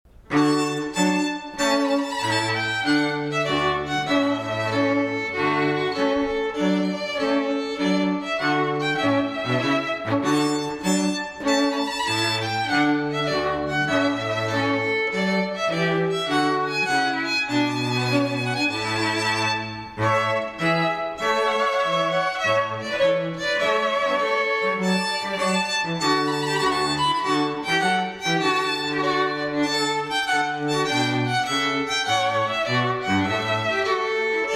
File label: Trumpet Tune TRIO